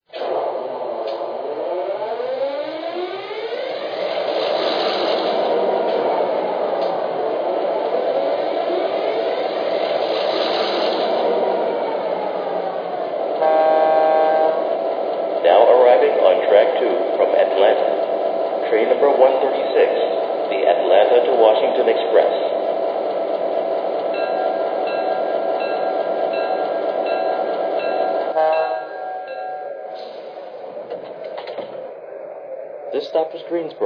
e6, trains

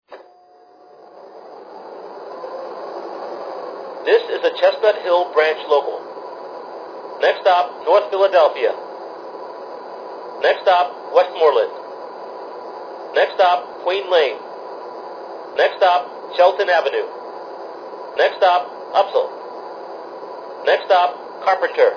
mu, trains

